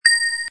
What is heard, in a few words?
bell